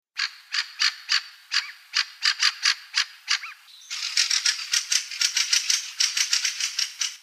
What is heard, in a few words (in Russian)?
ryabinnik golos